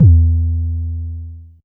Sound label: 808 E1, Kicks; Mbase 11 Kicks